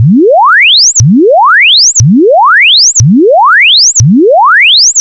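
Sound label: exponential chirp, sonar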